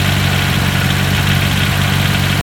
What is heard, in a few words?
Vaz 08 Low